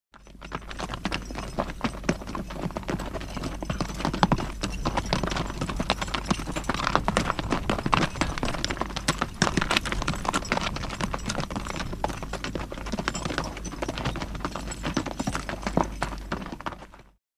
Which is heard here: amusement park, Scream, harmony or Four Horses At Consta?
Four Horses At Consta